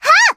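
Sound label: attack, Japanese; suzette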